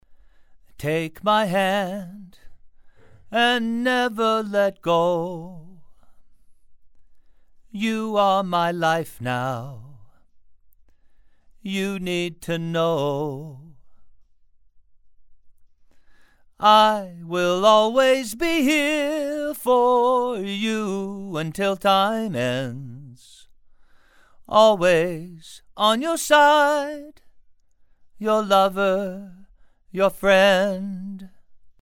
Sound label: Male Vocals